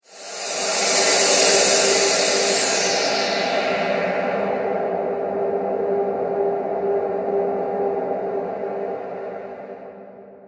High Breathy Transitions Effect; Scary Soundscape; Horror; Crafted Signals

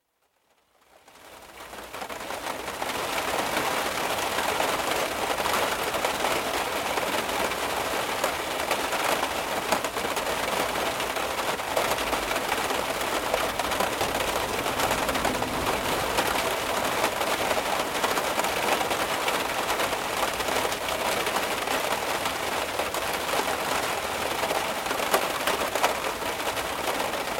Heavy rain on car roof (Interior acoustic); Rain (Sound Effects:Weather); BBC

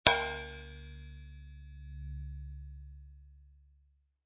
Cartoon Drum Cymbal Hit, Ride cymbal ringing dink; Comedy/Cartoon; Funny Sounds; Lynne Music

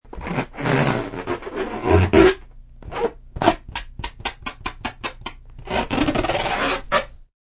Balloon Sounds, Various sounds of a balloon; Bars

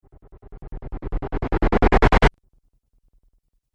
Cinematic Riser Abrupt Stop

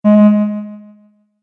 Message Error, beep

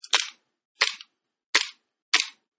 Toy Airsoft Handgun Dry Fire-4 Takes; Toy Guns; Toys

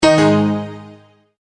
multimedia tone end; McKinney Sound

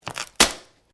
Branches Dead Snap; Material components for constructing more complex sounds; Snaps; Objects

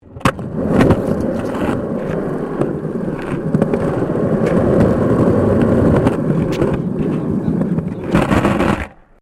SKATEBOARD UNDERSIDE ASPHALT SMOOTH; Skateboard sounds; Skateboarding; Sports

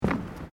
Footsteps Boots Boardwalk Wooden Step; Footstep Foley; Human

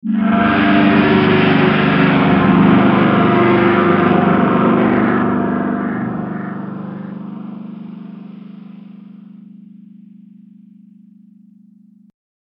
Future Flight Motor Engines; science fiction sound effect; star wars / trek style games

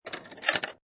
Pinball Sound Roll On Rail And Hit Metal Gate

